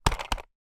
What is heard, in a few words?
toy plastic rollerskate drop on floor